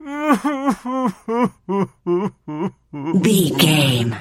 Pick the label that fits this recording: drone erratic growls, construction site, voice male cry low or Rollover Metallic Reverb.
voice male cry low